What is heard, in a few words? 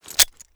generic checkout, weapons; STALKER; IWP